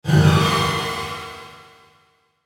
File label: Hit Impact Science Fiction; Blastwave